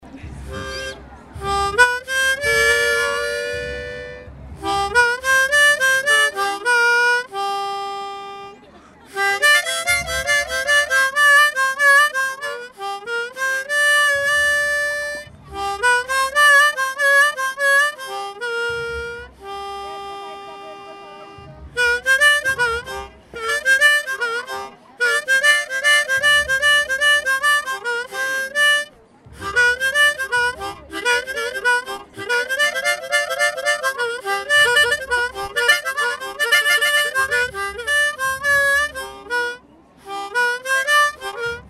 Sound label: harmonica; Pato